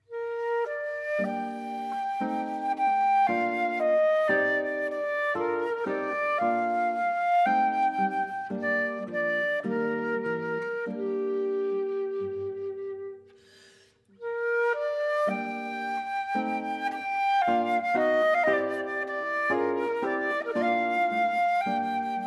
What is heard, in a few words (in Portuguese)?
Melodia Rustica II; PureSound